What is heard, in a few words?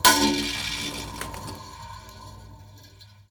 wire